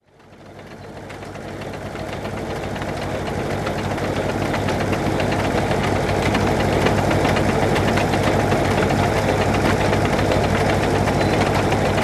moulin portatif et tracteur; mz-n710; bourg lastic

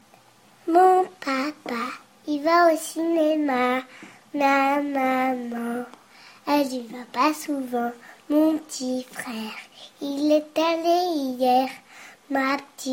comptine

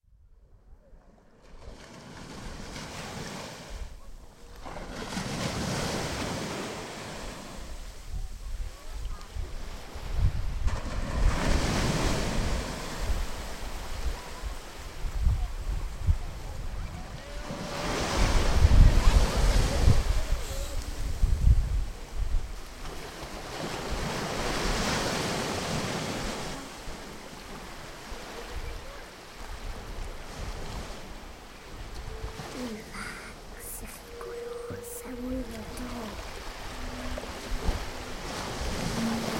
les vagues; classe de mer

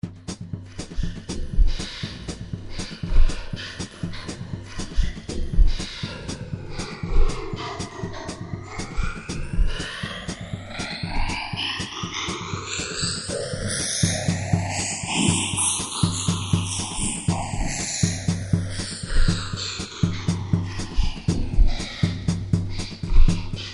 batterie

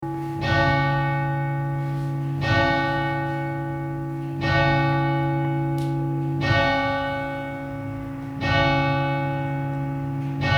cloche eglise

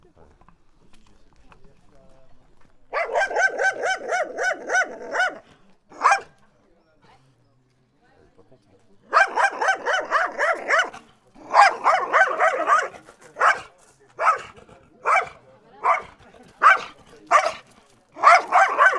vie de chien

